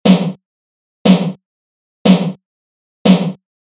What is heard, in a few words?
EMU Snare Pro Q Boost and scoop EQ